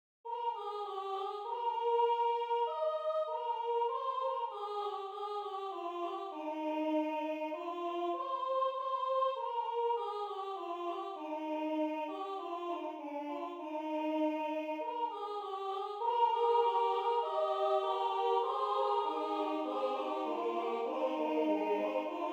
Ia sculati boieri mari, Ardeal, colinde

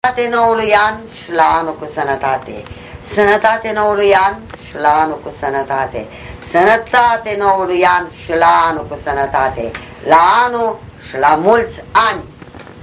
Semanatul, cantec popular vasluian; Centrul Judetean pentru Conservarea si Promovarea Culturii Traditionale Vaslui

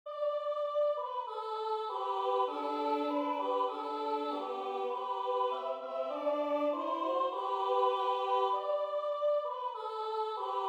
Sculati sculati boieri mari, Bucovina, colinde

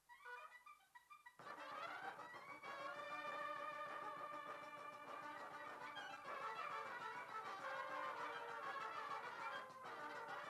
Rusasca la fanfara; jocuri si cantece batranesti; Vaslui

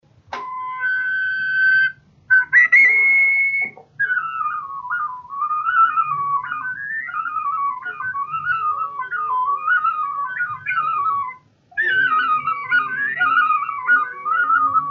De joc fluier; Vaslui

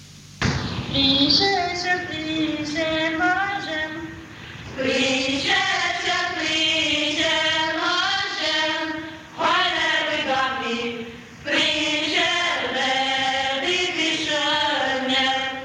joc si cantec popular vasluian; Muzică românească; Centrul Judetean pentru Conservarea si Promovarea Culturii Traditionale Vaslui

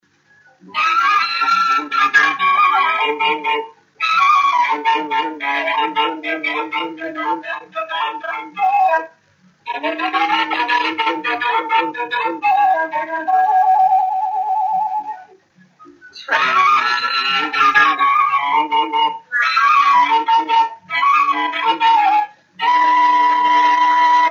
Doina fluier; Vaslui

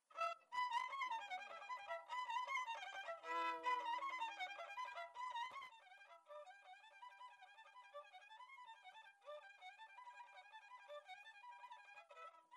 Floricica la vioara; jocuri si cantece batranesti; Vaslui

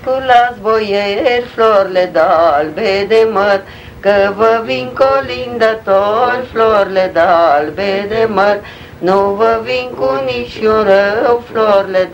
joc si cantec popular vasluian; Sculati boieri

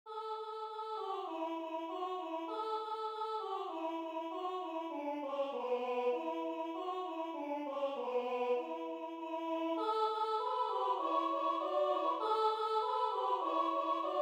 colinde, Ardeal; Veseleste te Domn bunu

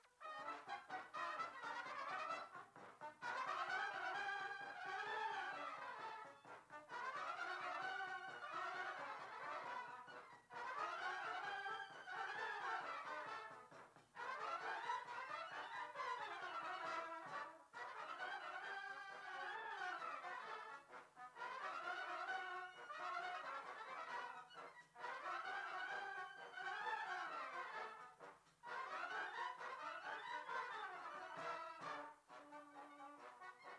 Hora la fanfara, jocuri si cantece batranesti; Vaslui

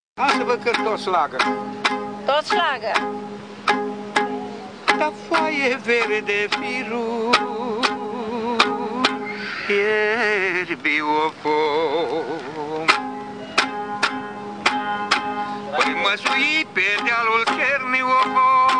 voce si cobza; UCMR - UNIUNEA COMPOZITORILOR SI MUZICOLOGILOR DIN ROMANIA

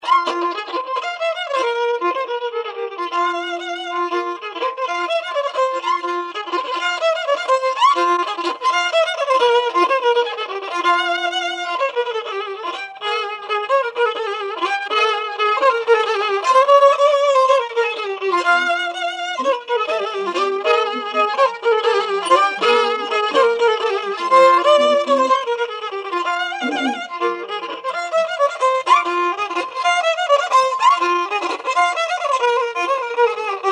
Polca la vioara; jocuri si cantece batranesti; Vaslui

